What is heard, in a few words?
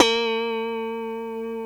FUNK POP, STRAT; Spectrasonics; Hans Zimmer Guitars VOL-1